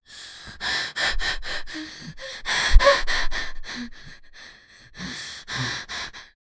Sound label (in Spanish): chica siente dolor y comi, breathing